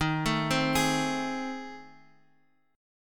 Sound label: A Minor Major 7th Flat 5th